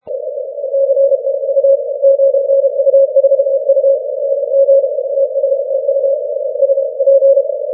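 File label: 30 Morse code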